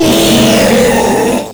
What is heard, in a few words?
Proto Infested Ancient Vocal Attack; Warframe